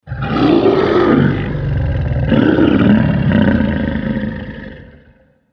Massive Dragon, monster

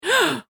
Human Female Gasp; Gasping; Voice

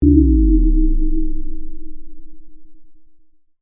Ray Beam Deep, Scifi, Low, Bass; Science, Fiction; Battle

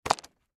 Wallet Leather Drop Rocks; Drops; Objects; Blastwave; Foley

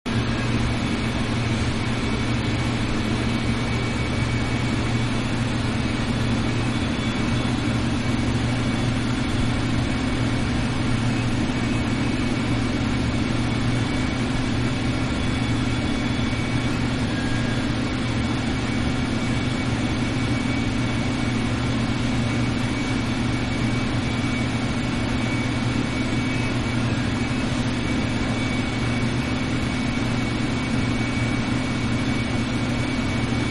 Nuclear Generator Steam Turbine, Room; Machinery; Machines; Manhattan Production Music

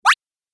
Game App Slip, Video Game Sound Effects

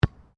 SOCCER BALL BOUNCE ASPHALT

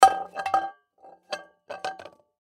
Pot Set Down; Pot Stainless Steel 3 Quarts Set Down Wobble Cement Surface; Pots And Pans; Household; Blastwave

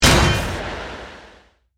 Hit Metallic Impact, Airy, Whoosh; Production Element, Imaging Element, Accent, Transition; BlastWave

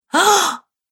Woman Gasps In Fear; Gasping Sound Effects

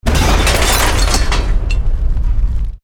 EXPLOSION MEDIUM METAL GLASS

